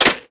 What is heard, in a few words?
mag in end, weapons; cstrike; valorant; rgx 11z pro vandal